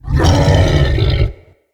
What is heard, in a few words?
Corrupted Melee Attack Growl; Vocals; Bromius; Animals